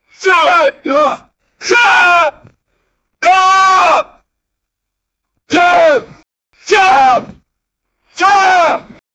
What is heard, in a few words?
a man screaming; shout